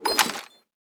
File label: breach throw; Counter Strike Model Pack; CS:GO; survival